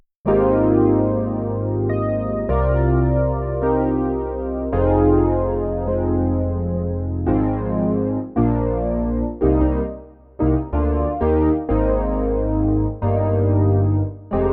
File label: piano; diva